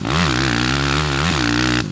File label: Mid, Engine